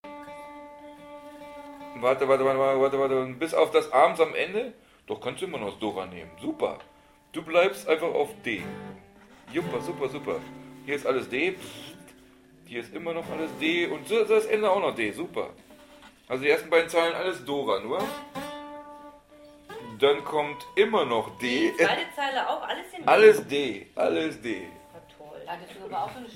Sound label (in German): stimmen suchen; roll in my sweet babys arms